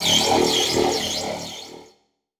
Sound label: Transition Communication Distortion Scanner; Adobe; Production Element